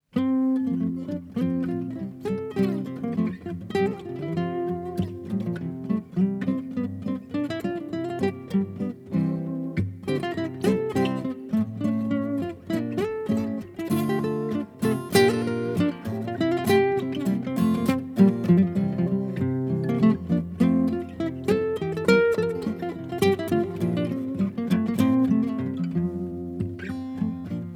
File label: Water Music